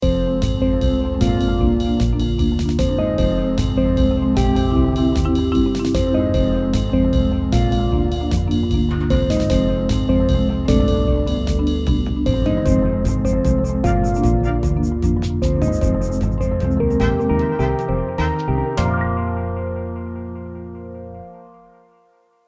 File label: loop